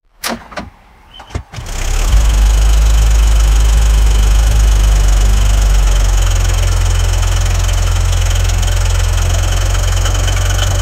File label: internal combustion engine